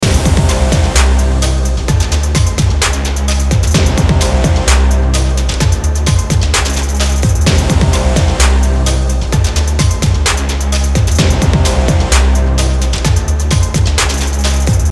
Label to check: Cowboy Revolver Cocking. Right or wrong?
Wrong